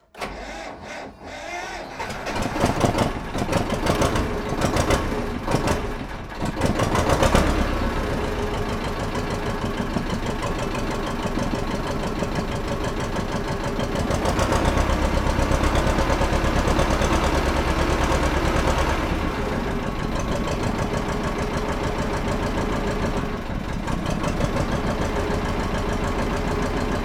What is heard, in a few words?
Tractor